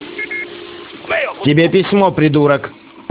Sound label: SmS